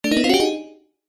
cartoon musical riff cheeky electric piano fast ascend; zapsplat